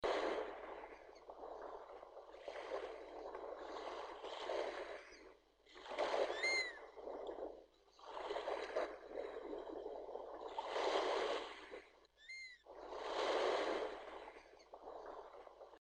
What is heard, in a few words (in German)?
Wellen